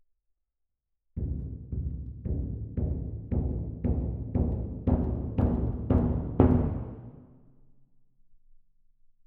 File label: Perc